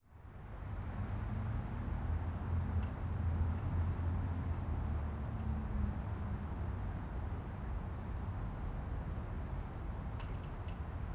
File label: Ambience Traffic Near Fire Station With Police Cars; AdobeCC